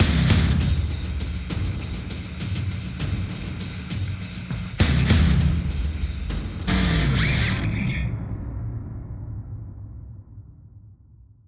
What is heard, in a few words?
Intro Skip UI; Crashers IntroSkip UI